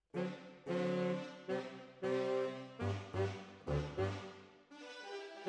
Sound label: Alto, RNR for piano